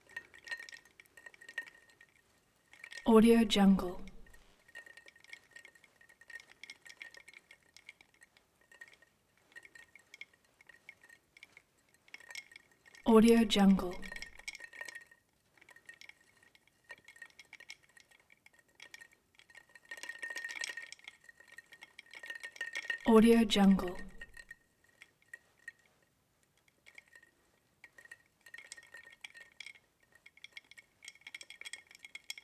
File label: efx int tray with drinks; collanderson